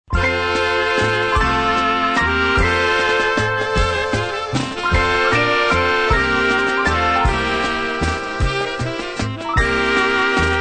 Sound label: waltz medley